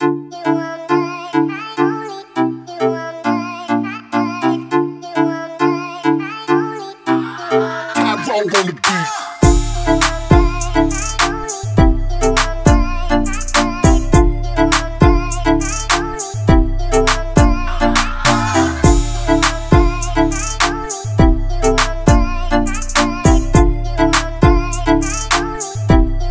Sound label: Instrumental